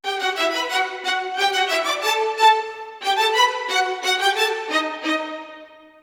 90 str theme violins, Orchestral arrangements, 90 E min